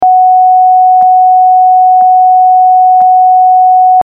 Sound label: Steady